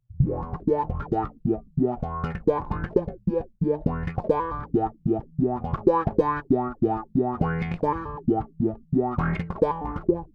Bass Iq, effector bass

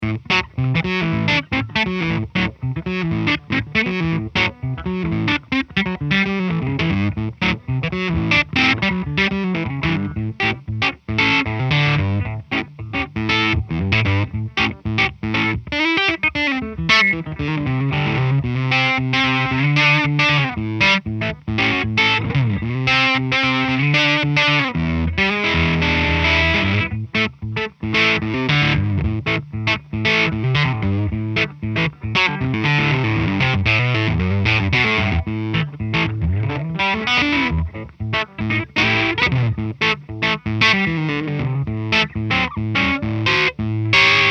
Maverick Full attenuation OD Funky; eminence; Funky overdrive - full FDM attenuation; Josh Smith

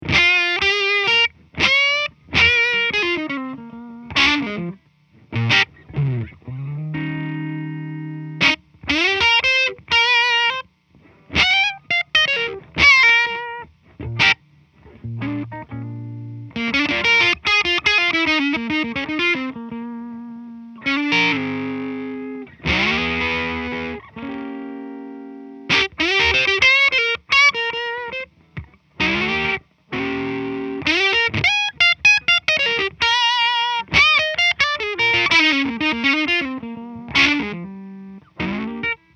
Blues lead - full FDM attenuation; eminence; Josh Smith; Maverick

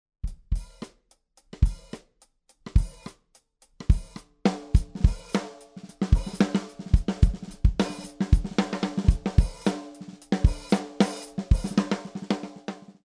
DW PERFORMANCE STEEL 14x6.5; otoya

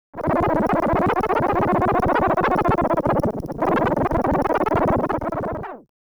scribble, dj scratch

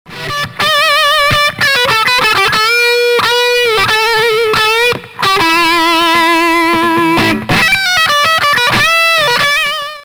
Digitech Screamin Blues, effector

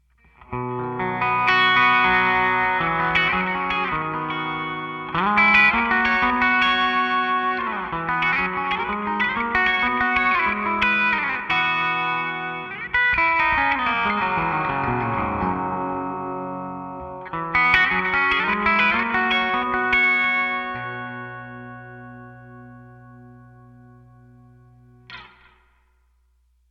legend em12n soft rock; eminence; Bluesy Strat

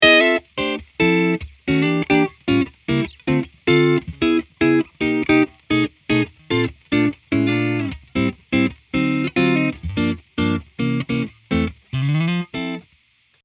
Full attenuation Jazz, Maverick; eminence; Jazz chord melody - full FDM attenuation; Tomo Fujita

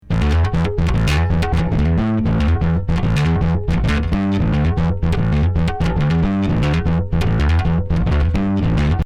Microbass, bass

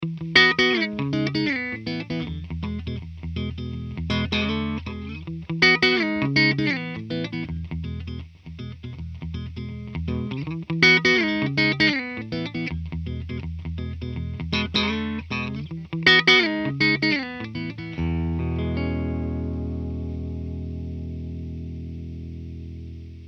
Full attenuation Pop, Maverick; eminence; Pop rhythm - full FDM attenuation; Josh Smith

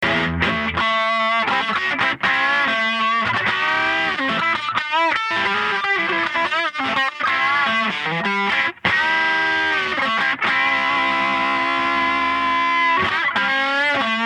digitech df7, effector

